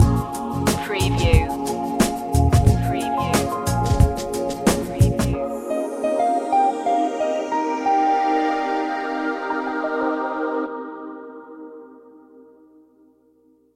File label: smooth waves stinger